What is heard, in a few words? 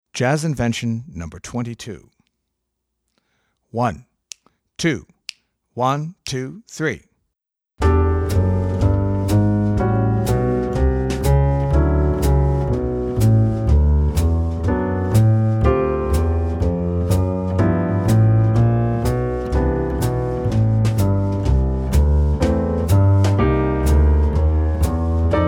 Jazz Invention, piano; Jazz Inventions for Keyboard